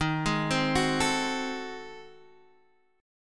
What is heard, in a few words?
G sharp 7th Flat 9th D sharp, chord